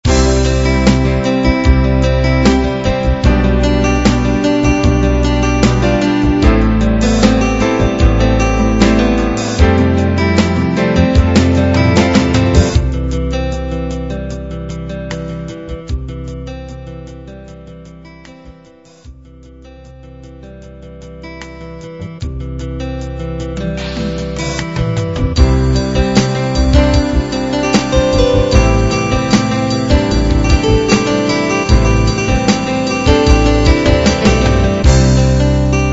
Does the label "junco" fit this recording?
No